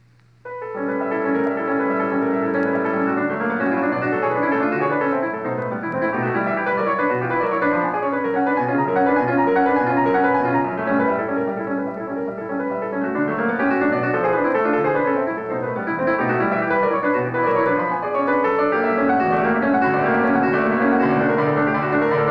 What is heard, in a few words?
Prelude in D major; Frédéric Chopin